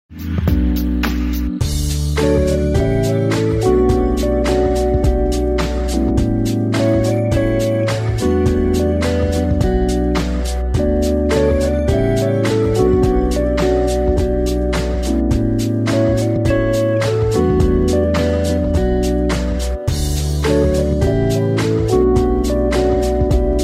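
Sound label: Early Morning Ambiance, soothing music for spas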